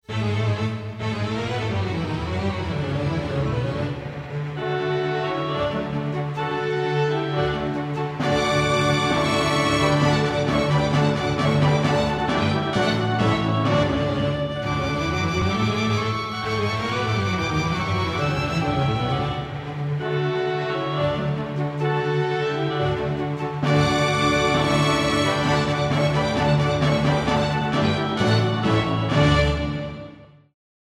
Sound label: Easy Listening & Classical; Sound Ideas